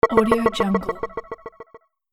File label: videogame hit ding repeat